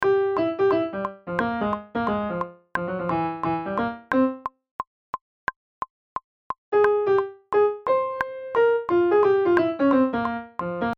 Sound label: sax